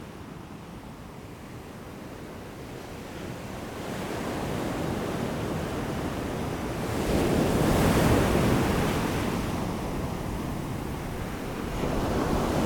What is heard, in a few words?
Nature Sounds Ocean Waves